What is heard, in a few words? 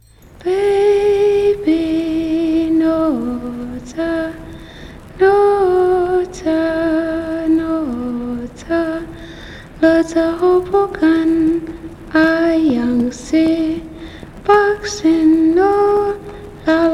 creek lullaby